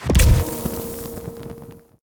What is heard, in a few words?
skill paper circle